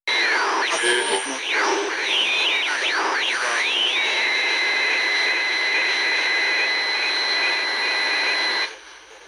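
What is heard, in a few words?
Radio long wave Static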